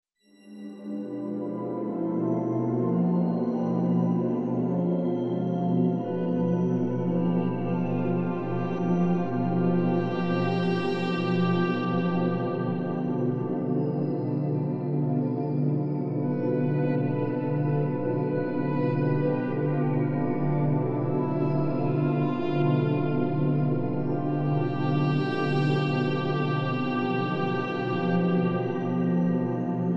Amb Sound Scape Dramatic ambience, Vioboe, Balalaika plucks; Musical; Ambient SoundScapes